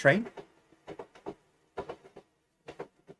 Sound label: train